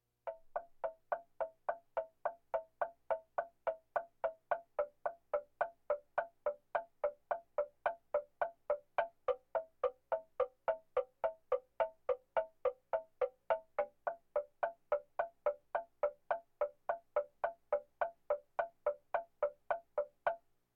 Percussion Bongos Tympani rolls Gongs Drums

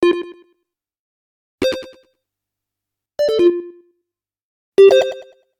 Arcade Click Neutral; boom library; The Interface

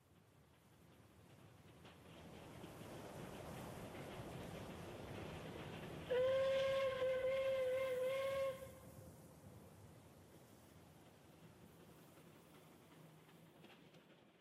Cowboy Ambi; Pitch Black Audio Pong

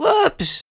Bad Performance; AudioWizards

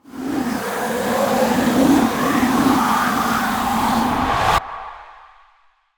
Ice Spell Freezing Slowing Hypothermic Field; Arctic Aura

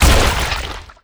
Blood Splat Gib; SFX Kit; Foley - Misc

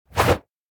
Whoosh Strike Air Whip Like Impact; Hollywood Edge; sound designer's toolkit v2

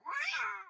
hit; wilkins; Blind Drive

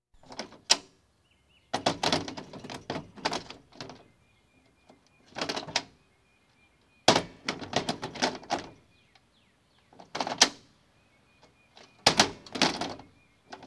Police, Fire engines

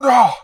Life Lost; Mezafort; AudioWizards

